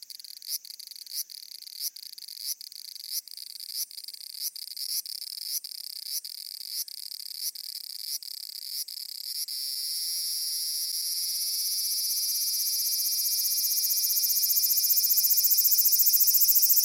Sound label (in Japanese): アブラゼミ